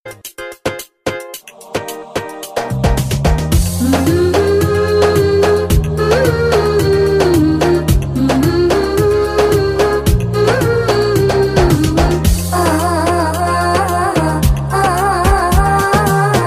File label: arabic ring